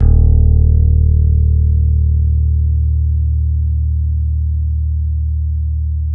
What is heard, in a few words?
H. FLS E 2, Bass; Spectrasonics; Bass Legends